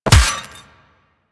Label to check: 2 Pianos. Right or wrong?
Wrong